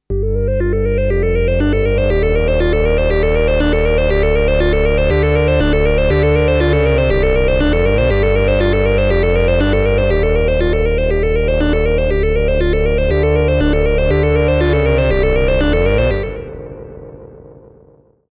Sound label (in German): matrixbrute2 tonhöhenmod lfo1